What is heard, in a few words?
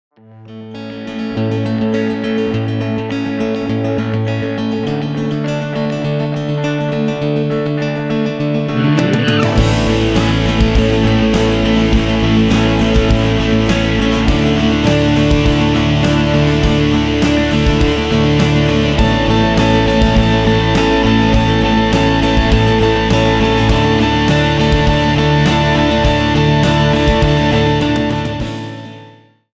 102bpm remix